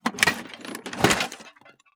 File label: Part Assembly; TOOLKIT vol.2